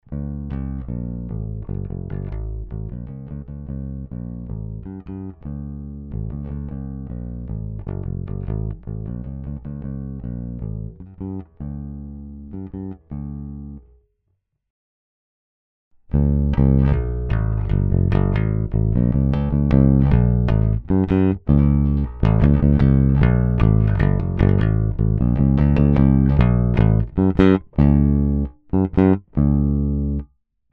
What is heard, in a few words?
Pbass